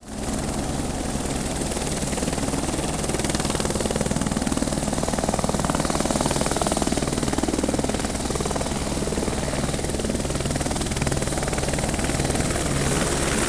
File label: helicopter take off, machine